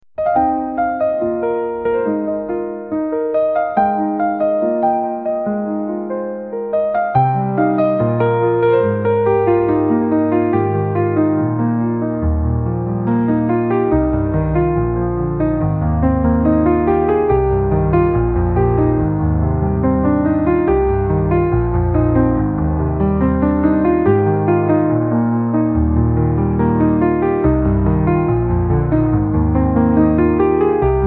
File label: piano solo